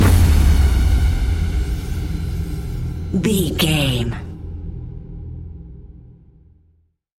brooding darkness beckons stinger

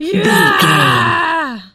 voice female battle shout

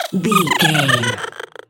goblin fairy laugh high

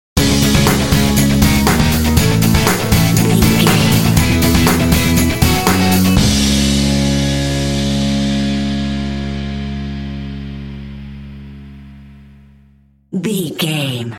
indie funky dance sting

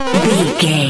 retro teleport warp effect

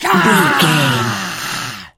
voice male battle shout long

